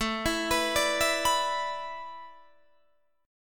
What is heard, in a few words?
A Suspended 2nd Suspended 4th, Guitar, DADGAD